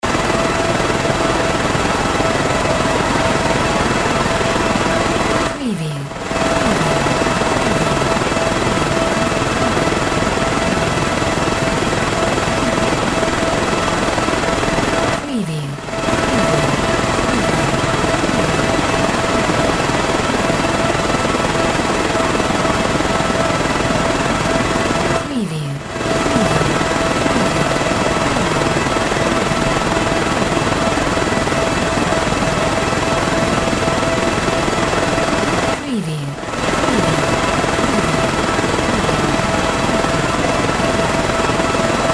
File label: HELICOPTER SEA EXTERIOR; windy beach audio